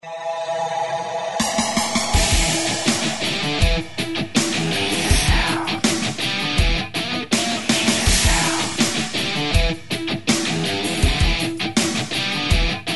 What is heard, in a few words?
Burn - 162BPM, Rock